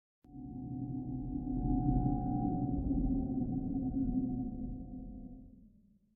Deeper; lethal company

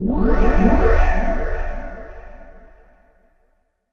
Jingle Positive; Super Rub 'a' Dub; PS3

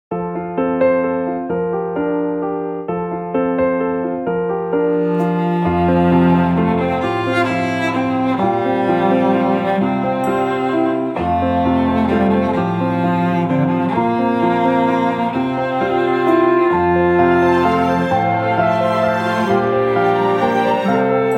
The Greeting Waltz; Dear Me, I Was

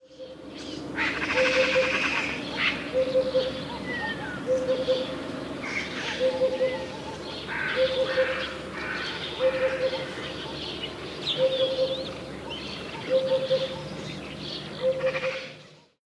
Birjand Bird

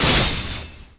Enemy Weakpoint Hit

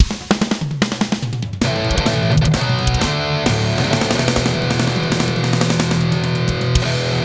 Guitar Celebration; Rock 'n' Roll Climber; Wii

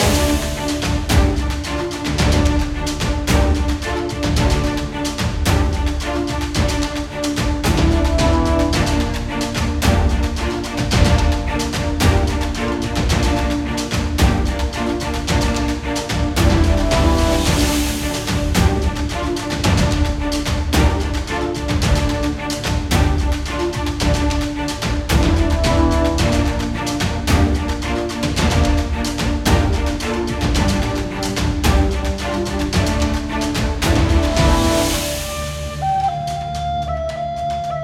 battle main

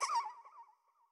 Bat Death

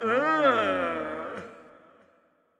Toxic Mask Large Effort

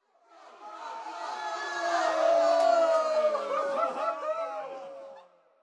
Trial Complete Crowd Cheering Incoming; subway surfers city sound effects; Android, iOS

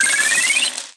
Pick Up Coin Sack

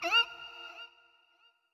Toxic Mask Hurt; Going Under

